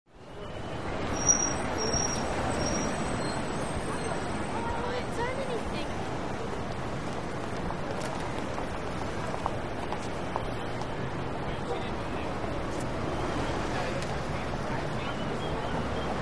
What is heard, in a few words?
Bus Stop Busy City Tra